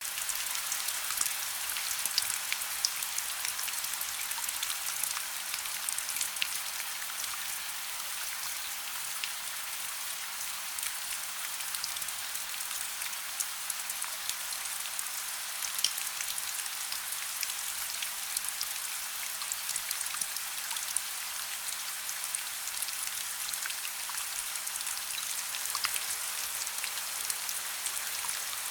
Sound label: relaxing rain sounds